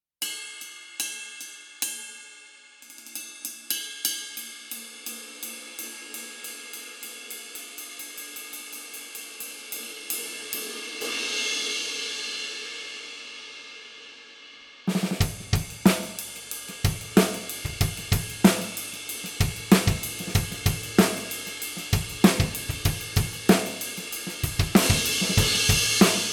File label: 24 Brilliant Medium Ride